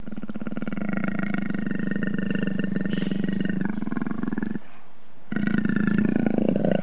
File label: leopard, mammiferes sauvages; Animaux